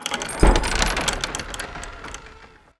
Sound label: wood op; Mafie 1